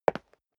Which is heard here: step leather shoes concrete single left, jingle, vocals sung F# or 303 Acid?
step leather shoes concrete single left